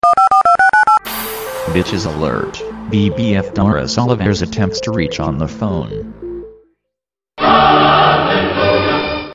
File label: alert